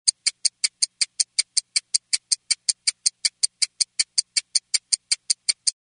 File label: stopwatch loud ticking